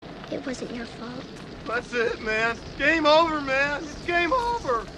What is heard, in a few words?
aliens game over